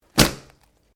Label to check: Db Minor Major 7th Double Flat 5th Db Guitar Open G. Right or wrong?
Wrong